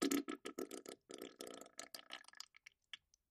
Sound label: Bowl Fart Wet Soft; farts